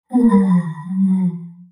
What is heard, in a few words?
Stun; Drash